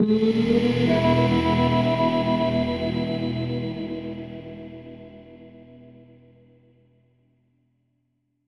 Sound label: Character Selection